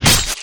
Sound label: Knife Skin, Damage